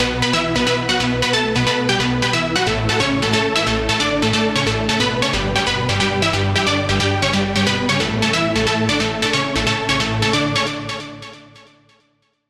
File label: Key; Outer Spaceship; Routed Sound; Vital Expansion - Star Scavenger Vol.1